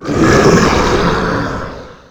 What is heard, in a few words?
golem breath